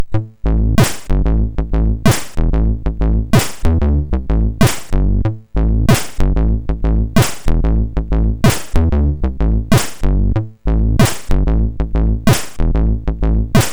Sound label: Beats tune, sid MOS6581R4